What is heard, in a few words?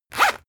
zipper open harsh; objekte; reissverschluss